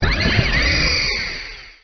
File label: Cry; Pokemon Essentials; Pokemon Circon BETA 2 v1.8